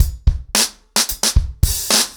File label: 110BPM, ACOUSTIC DRUMS; The Roots Drumloops